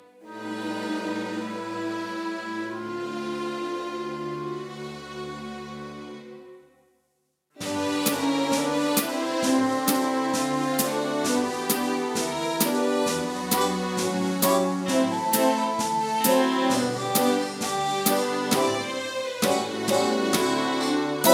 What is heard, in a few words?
accompaniment